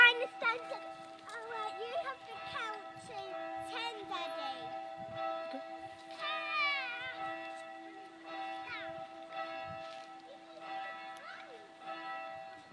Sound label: bell test prior to wedding highest tone bell with sound of child; st andrews farnham